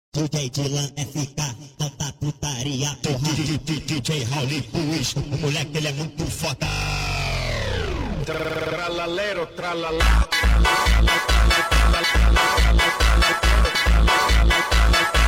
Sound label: tralalelo tralala phonk